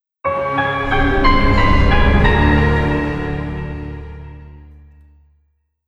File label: Gain Item Sound Effect; Corpse Party Blood Drive